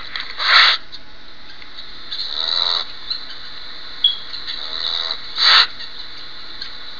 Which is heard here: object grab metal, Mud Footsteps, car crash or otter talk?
otter talk